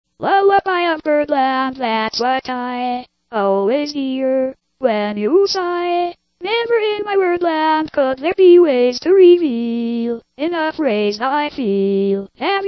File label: lullaby